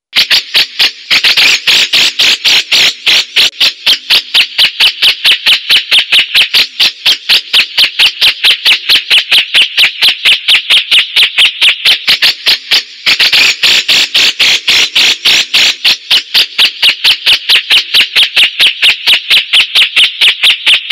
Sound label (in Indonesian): cucakjenggot